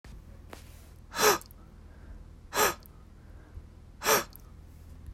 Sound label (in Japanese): 吸気性裏声